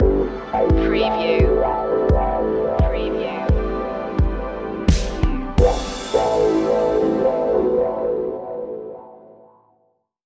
drive mode stinger

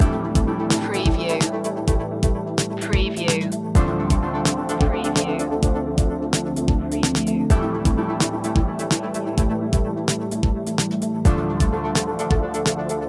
broken beats loop, chill

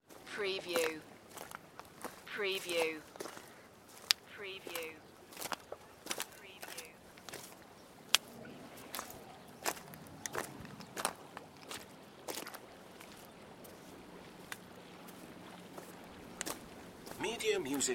Footsteps on wet pebble beach; beanstalk audio

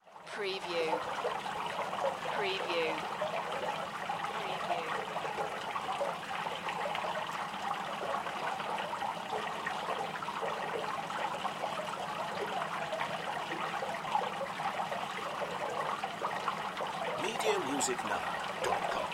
Fountain Run Small

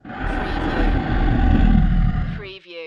Monster Breath Deep; sound-effects-bible

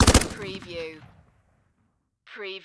Machine Gun Steyr Aug

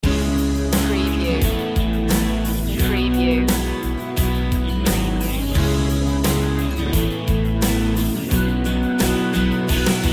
melancholic day sting

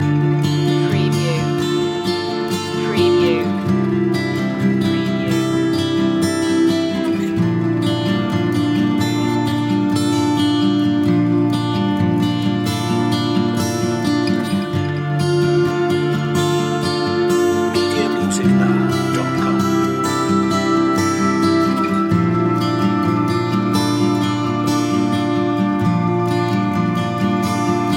take my hand loop

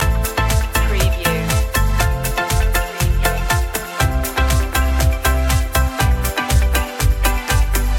summer beach loop

